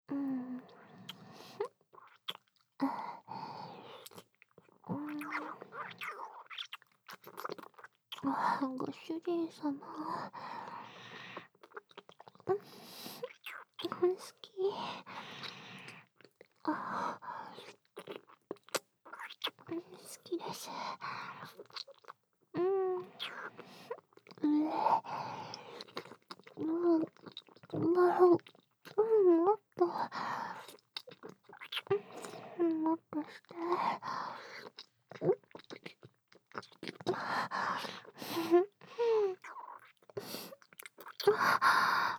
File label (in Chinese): 恩恩爱爱Kiss; 和帕特莉西娅的恩恩爱爱Kiss; Only音轨